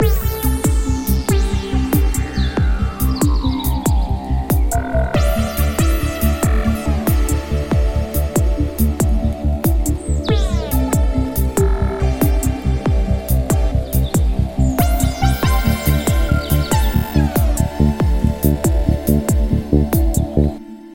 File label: Warm Vibes